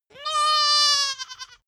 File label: goat baa lamb classic